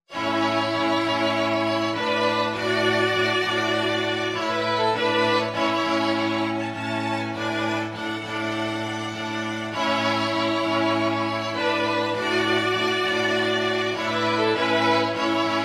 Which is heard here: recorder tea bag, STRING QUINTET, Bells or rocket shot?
STRING QUINTET